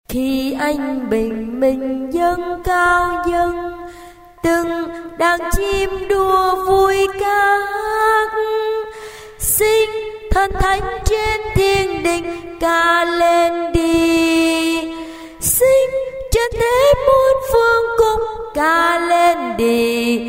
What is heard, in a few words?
basso